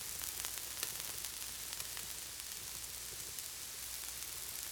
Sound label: barbecue sizzle